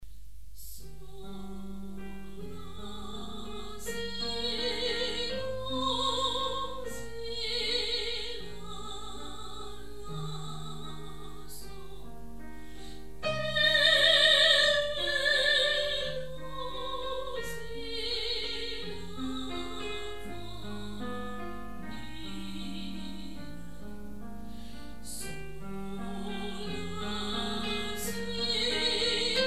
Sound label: Solfeggio